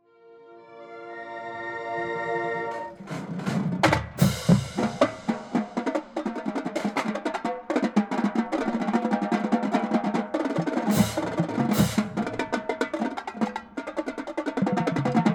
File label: Drum Feature, marching band